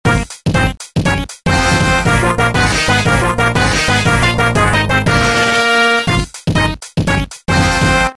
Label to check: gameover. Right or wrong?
Right